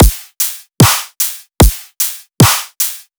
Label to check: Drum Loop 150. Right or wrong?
Right